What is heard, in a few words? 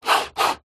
technology computer mouse movement desk